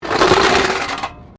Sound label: METAL BINLID SCRAPE WIRE FENCE; Metal (Sound Effects:Materials)